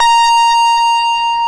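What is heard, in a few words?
A#4 distortion guitar; AKAI S-Series CD-ROM Sound Library VOL-8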